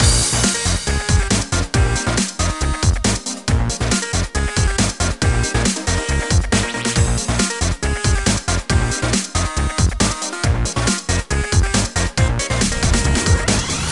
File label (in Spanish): musica